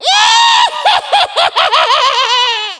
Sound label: laugh, spooky pumpkin